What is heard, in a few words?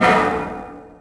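ambience interior clank; Tony Hawk's Project 8; XBOX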